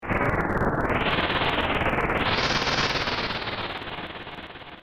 wind like; ff3; ff3us

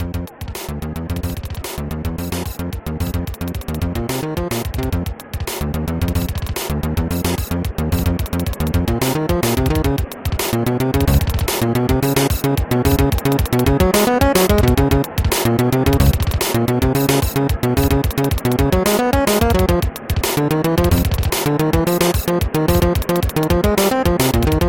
steel and snare; sd2

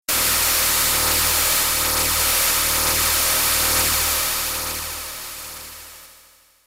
machine ray; ff3; ff3us

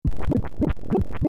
poison; ff3us

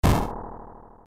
close; ff3; ff3us

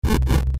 fire; ff3; ff3us

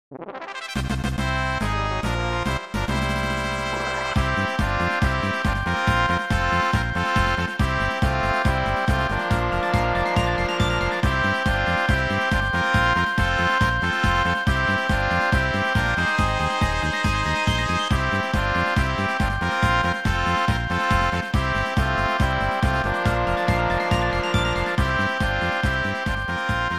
victory fanfare; ff5

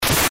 sword slash; ff3us

